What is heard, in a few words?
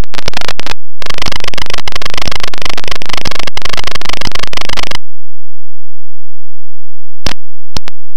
laser